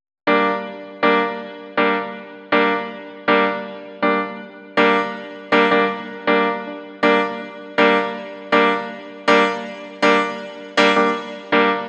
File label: 080 Roots Reg Piano; Digidesign; Pro Tools; Pro Tools Essential Demos; Essential Loop Demos; Demo Session - World